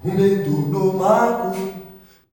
una solo, male; Spectrasonics; Heart of Africa 2; S.MALEAMB

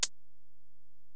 HiHat, Alesis DM-PRO

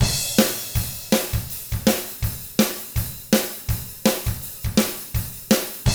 ROCK, Double Platinum Drums

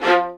LDVLN1 ATT F, LOUD VIOLINS; Q Up Arts; Denny Jaeger Master Violin Library

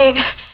fx vox; E Lab; XTc Files of House

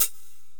Korg x5, Drums

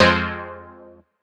stab technoir, stabs

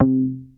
Bass Synths hits and octaves

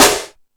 All Snares, Drum Machines

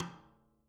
STK STK2, Living Drums; Sampleheads; Peter Erskine Living Drums; JZ XTOM 1

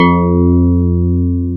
PIA DX E-PIANOS

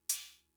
Cymbal, Fricke MFB-522, Drum Machines

